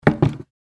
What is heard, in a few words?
Small wooden box container close